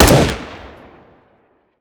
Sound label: galil, weapons; deagle; csgo